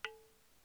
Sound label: Tapping